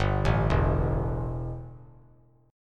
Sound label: E Minor Double Flat 5th E; arpeggiated; JGuitar